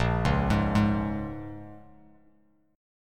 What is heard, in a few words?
A sharp Minor 7th, Bass Standard; arpeggiated; 6,4,6,1